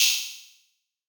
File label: diffuser hat